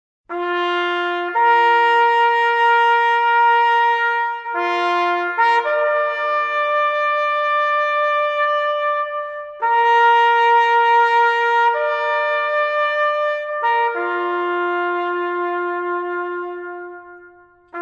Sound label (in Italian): Il Silenzio (Tromba Sola); La giornata dell'Alpino 2003